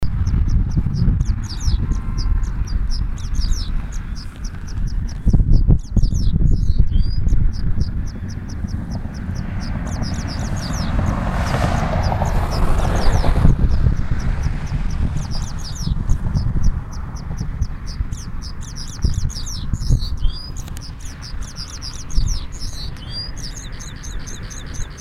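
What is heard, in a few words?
pipit blyth Anthus godlewskii; Craig Brelsford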